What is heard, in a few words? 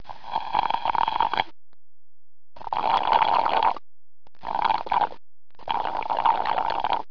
people